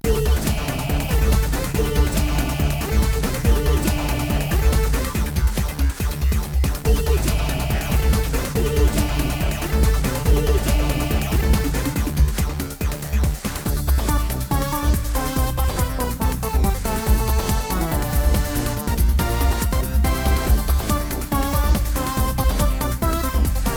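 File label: Loop Battle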